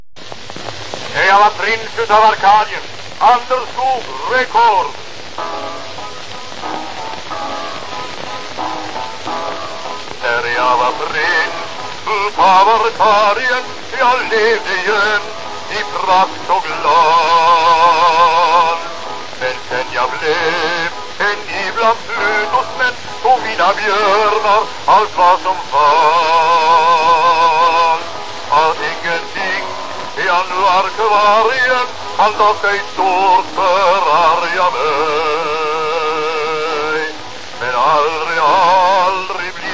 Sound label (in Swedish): fonograf; Ljudteknik